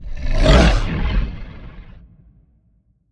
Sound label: Guarding Yeti Growl